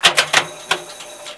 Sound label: Ticket Dispenser; Beep Sounds